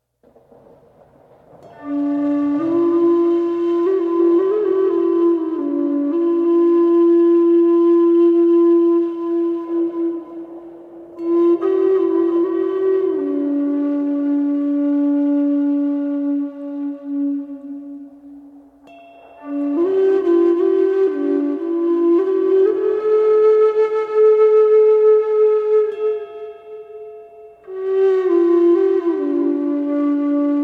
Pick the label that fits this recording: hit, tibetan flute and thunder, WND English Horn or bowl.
tibetan flute and thunder